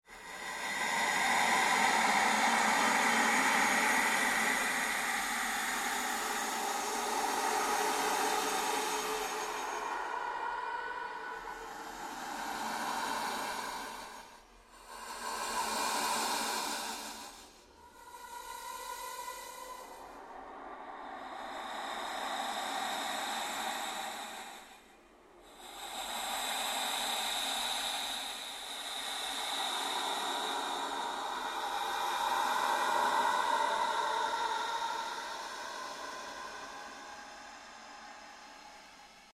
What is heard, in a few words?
Created Ambience Sweep, Rise And Dive Sound Effects; Sound effects created by manipulating other sounds; Rises and Dives